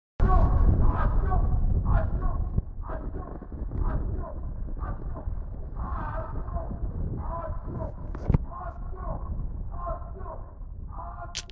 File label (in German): kiosk ostend hanauer land frankfurt am